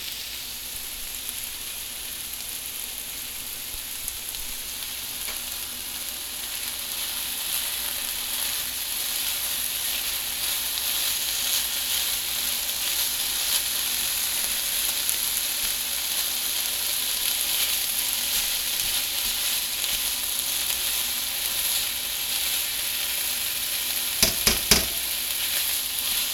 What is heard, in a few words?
Stirfry, binaural